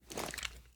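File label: pistol 1911 reload end; gmod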